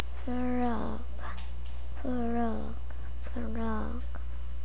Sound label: frog